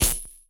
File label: Disruptor Shoot